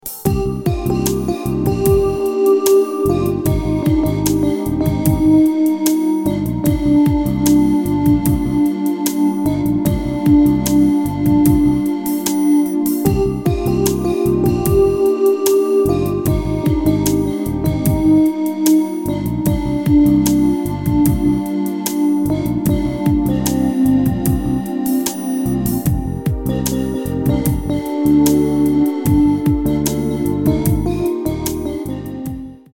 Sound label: Bossa